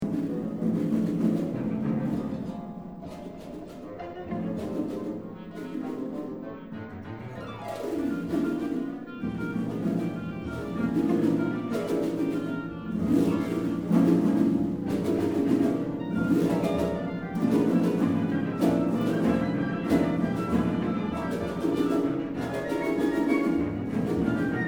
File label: Harp And Orchestra, Doctored Pentatonics; (World Premiere Recording); Never Far Away was co-commissioned by the San Diego Symphony, the Dallas Symphony